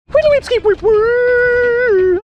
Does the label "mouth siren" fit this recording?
Yes